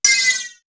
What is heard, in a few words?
HOUSEPIPE; East West; Global Sounds